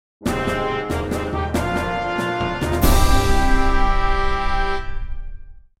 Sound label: jingle